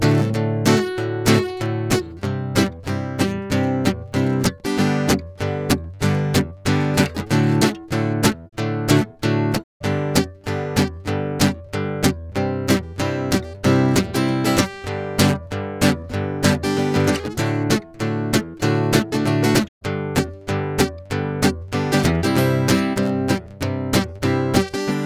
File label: Guitar Acoustic